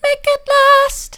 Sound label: make it last soft, vocal